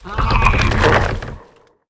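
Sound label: Elven Tree Attack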